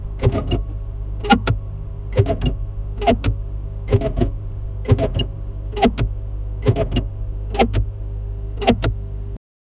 clip in wiper balde of, car

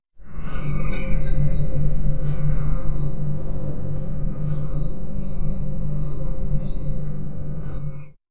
soft morning kitchen ambi; reflections

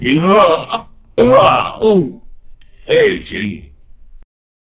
aggressive male shouting; combat

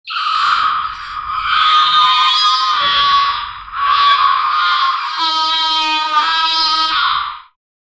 mahjong scrambling

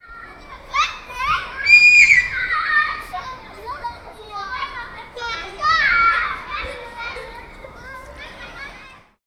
children playing

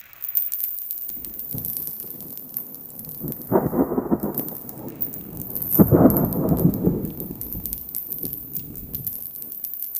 chain lighting spell soun

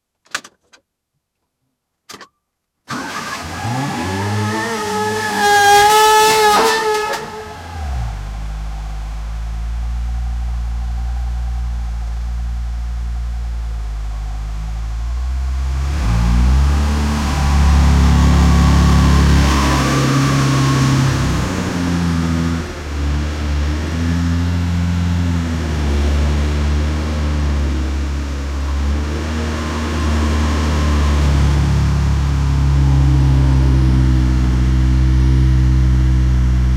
car start cars the motorc

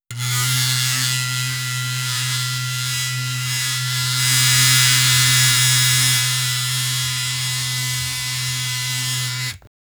razor blade usage sound, grooming

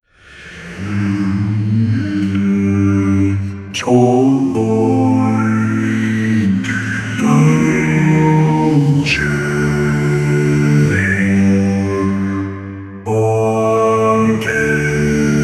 a popular heavy metal son

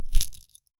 picking up a heavy item; object manipulation

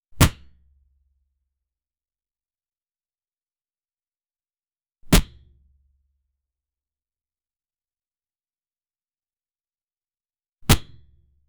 the intense muffled thud, blunt weapon

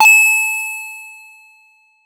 style experienc; minecraft

